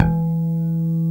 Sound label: JAZZ BASS, E3